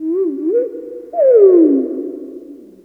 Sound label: whales, zaps and beeps